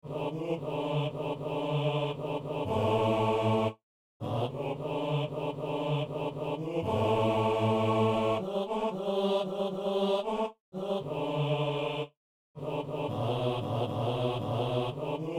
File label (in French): basse; Saint-Denis